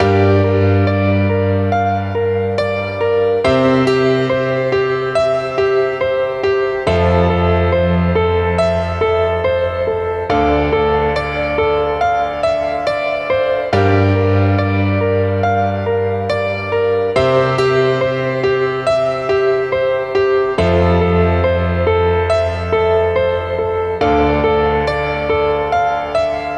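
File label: EMOTION PIANO LOOPS, 140 BPM, G minor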